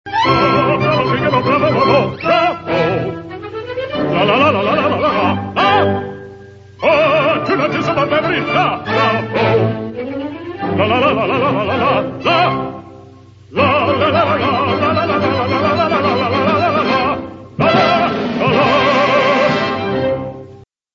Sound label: frog lalala; bugsbunny